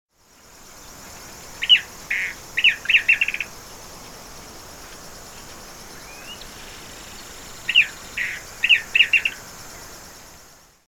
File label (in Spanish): siete colores, aves; lampa